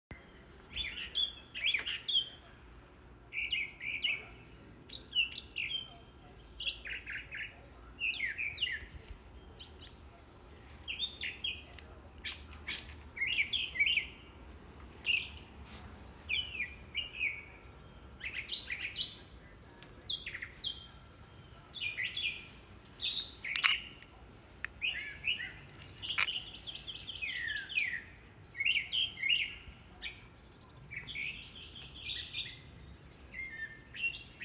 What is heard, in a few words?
Mocking Bird